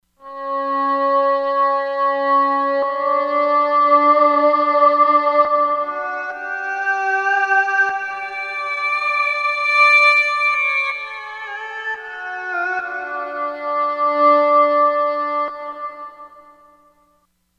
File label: Shou, Korg MSC-13S Ethnic